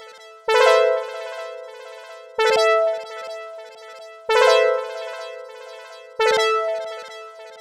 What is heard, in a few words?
126 Am flourish synth, synths and melodic loops